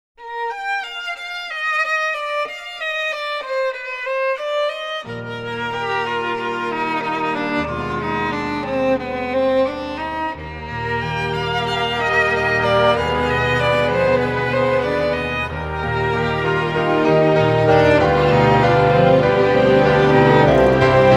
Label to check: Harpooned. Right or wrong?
Wrong